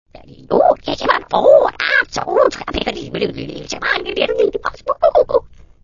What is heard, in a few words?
alien chat